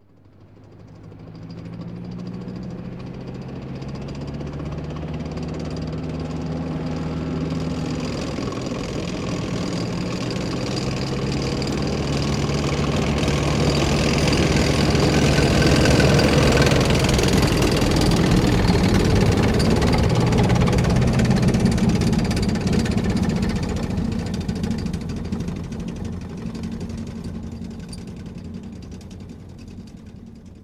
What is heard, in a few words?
Tank, Pass By With Heavy Clatter; gun and weapon sounds; captain audio; fully loaded